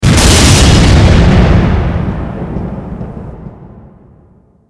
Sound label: bomb